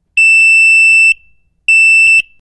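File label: Alarm Electronic Beep; hi-tech-various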